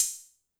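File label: Hi-Hat Open Analog Short; YAMAHA RM1X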